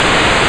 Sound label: DEEP